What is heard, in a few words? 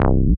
BASS STER.